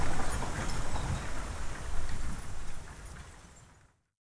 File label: Horse Drawn Wagon; Electa Quinney